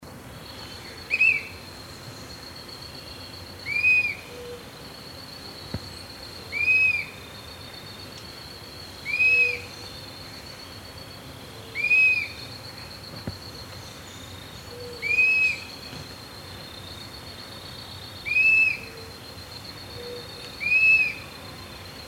Myiarchus tuberculifer Copetón Capirotado

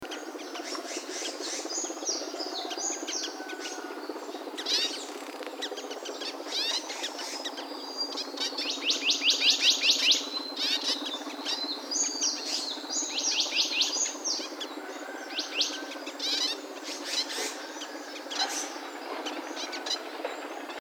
Lonchura malacca Capuchino Tricolor

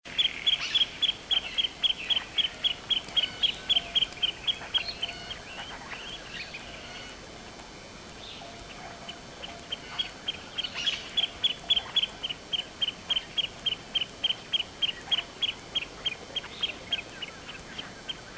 Gampsonyx swainsonii, Elanio enano

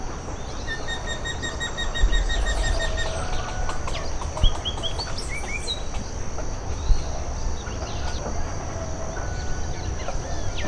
Colaptes punctigula Carpintero moteado

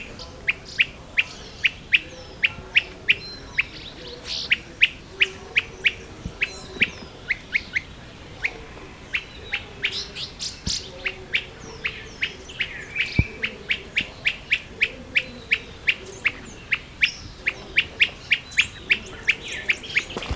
Myiarchus tyrannulus Copetón Tiranillo

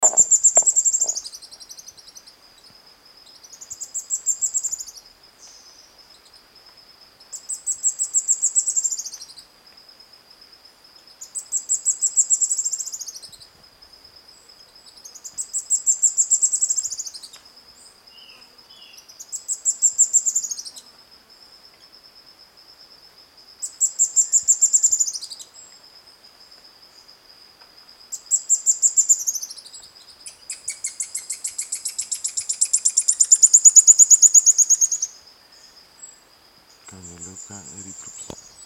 Cranioleuca erythrops

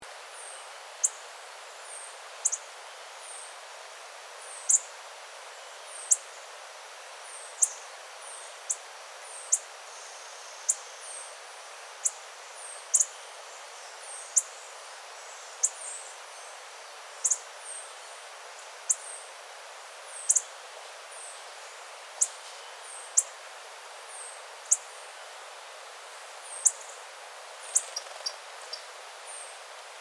Tangara Coroninegra Tangara heinei

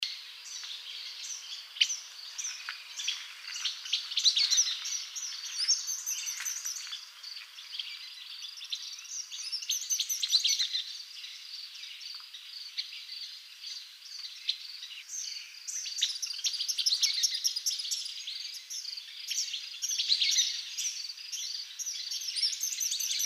Tyrannus tyrannus Tirano Oriental

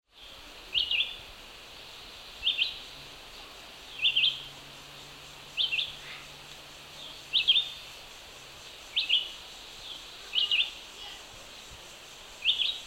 Vireo olivaceus

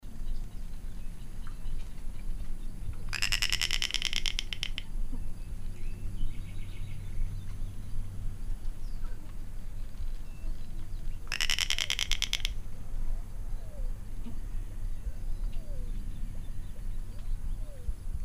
Rostrhamus sociabilis Caracolero común

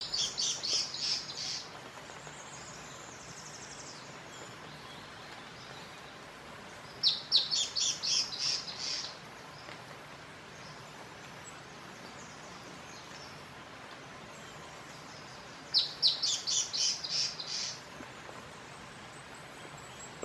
Streak headed Antbird Drymophila striaticeps

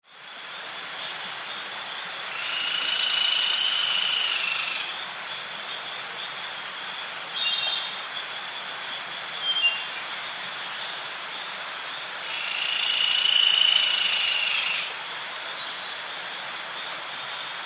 Colaptes rubiginosus Carpintero olivaceo

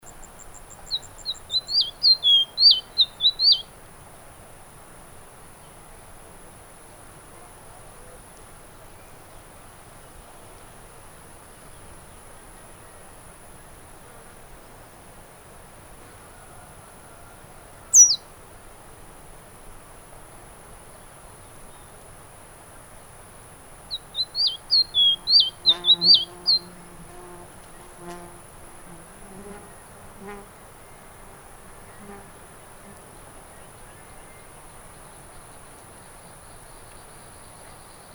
Sporophila minuta minuta Semillero Pechirrufo

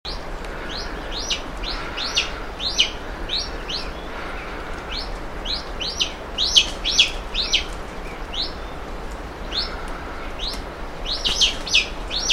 Chamaepetes goudotii goudotii, Pava falcialar

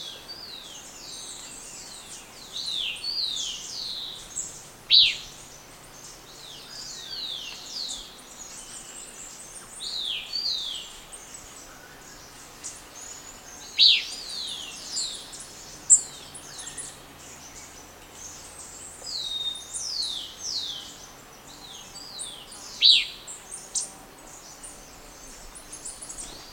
Elaenia frantzii pudica Fiofío Montano

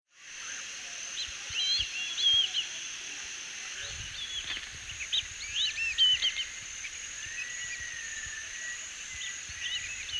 Dendrocygna viduata Suirirí cariblanco

